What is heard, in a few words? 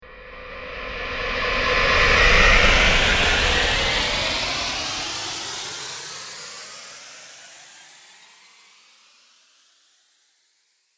Warp Factor, spacecraft; science fiction sound effect